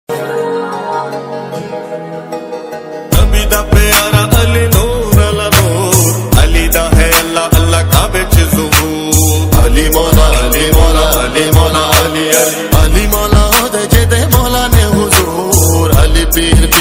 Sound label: islamic ringtones